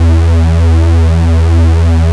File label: X TRA E3, X TRA SYN